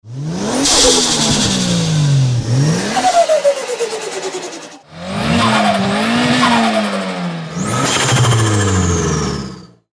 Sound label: turbo sound